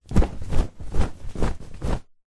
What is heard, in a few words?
household bedsheet flap shake